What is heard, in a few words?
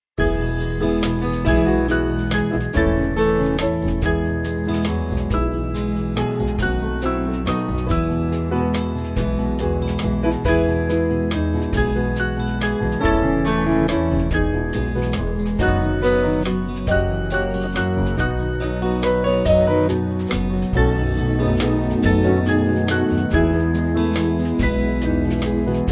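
Band, Free Music Backing Files for Churches; Take Time To Be Holy; Longstaff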